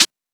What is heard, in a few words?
Open Hihat; Vengeance Samples; VEE Cymbals